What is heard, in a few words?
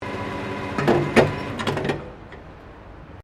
PETROL PUMP SHUTDOWN, Fuel or gas pump; Machinery Sound Effects; Machinery (Sound Effects:Machines)